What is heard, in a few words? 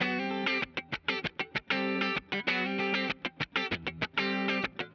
Electric Guitar, Loops; Guitars